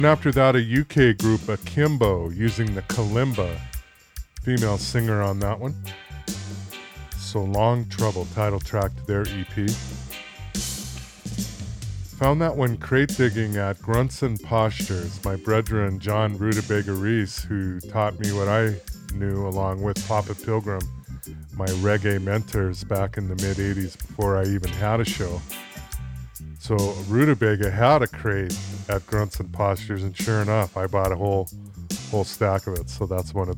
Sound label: grunts postures